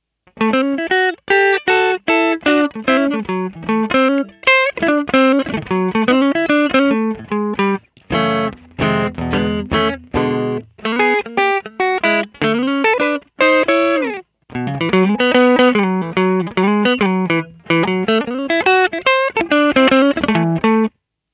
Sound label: Rapier Both